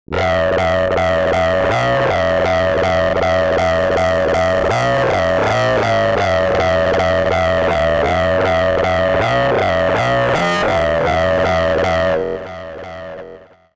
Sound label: Synth Mono